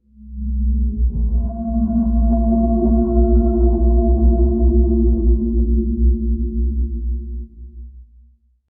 creepy eerie tyrannosauru, bellow

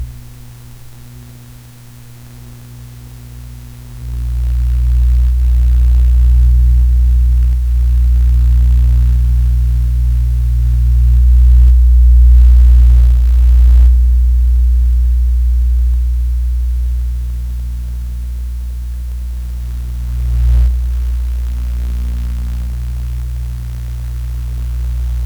low level broadband hiss t; noise

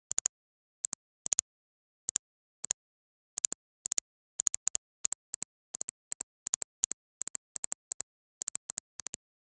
a clean high tech soundsc; frog croak

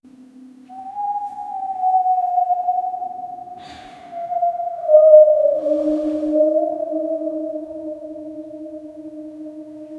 wind whistling through, wooden creak

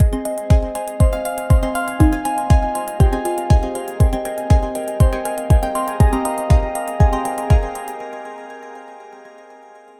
gentle plucks and minimal, chill

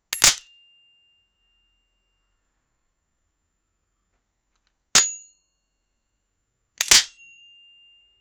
sharp metallic chink, gun

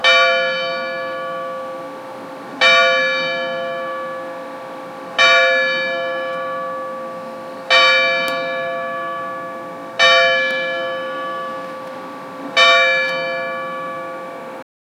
bell ringing of the ortho, church

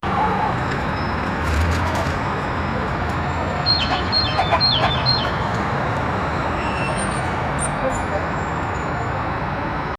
urban sounds parkhumans, car horns

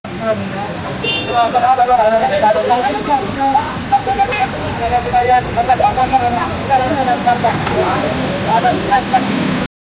street food in indonesia

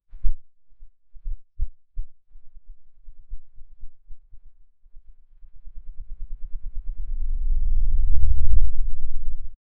fluttering whoosh

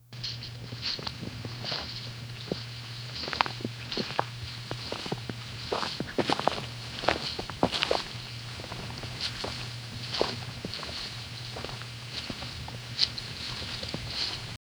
soft footsteps on grass; clothing

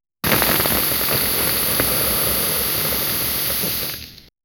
stirring wok; asian

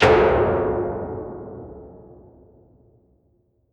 a sudden sharp psychic jo, disturbance

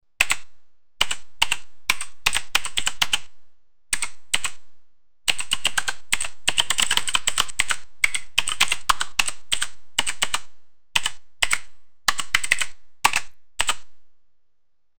keyboard typing sound

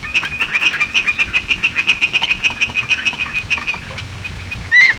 loud whooshing gust lifti; flutter

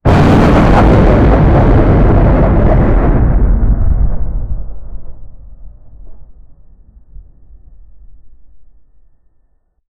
thunder lightning sound; weather

